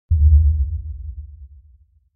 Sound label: Impact Sub Tension Drum Hit - Single; Hollywood Edge; sound designer's toolkit v2